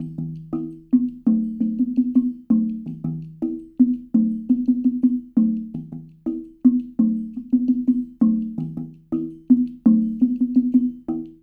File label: WHALEDRM; Spectrasonics; Liquid Grooves 1 & 2; SAUNTER